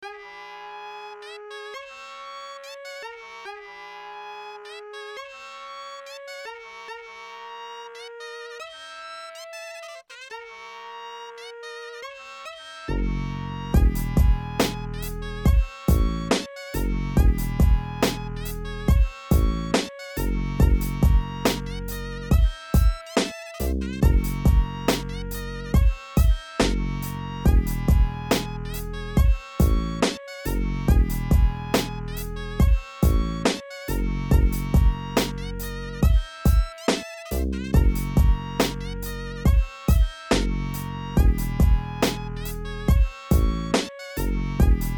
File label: bluz instrumental